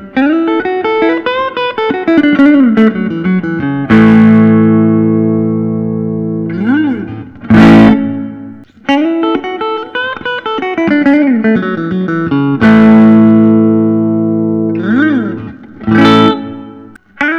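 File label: gibson custom 56 les paul reissue gold top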